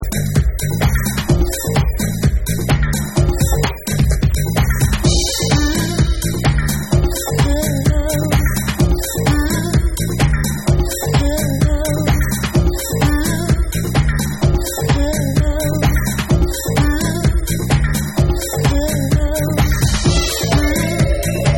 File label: Groove Instrumental